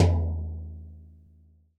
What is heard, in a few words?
TD-20 Tom B, Roland TD-20 Toms